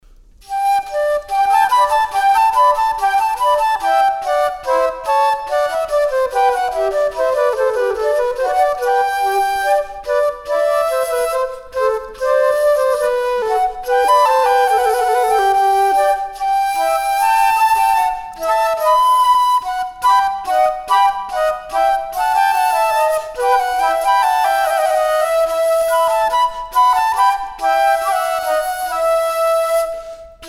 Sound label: FLUTE Duet